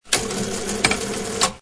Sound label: Motor